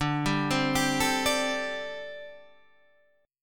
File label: D 7th Suspended 4th, down, Guitar, Half Step Down